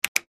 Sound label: Flip Switch Plastic; switches